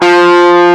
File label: EG OD F2; AKAI S-Series CD-ROM Sound Library VOL-2